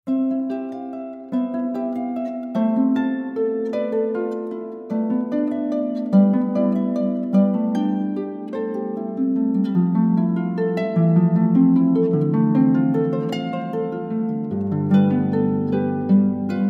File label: minuet solo harp